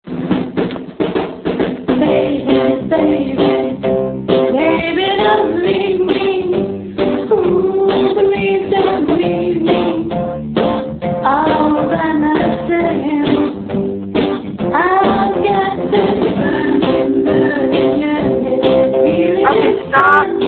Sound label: Singing